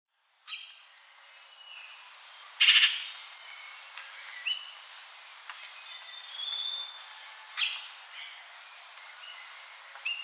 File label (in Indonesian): suara panggilan ekek layongan